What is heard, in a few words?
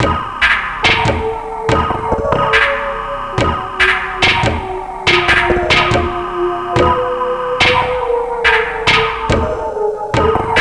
Industrial